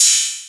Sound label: Cymbals Ride; Vengeance Samples